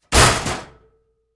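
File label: Door Locker Close Close Up Hard, Metal Door; Doors; BigRoomSound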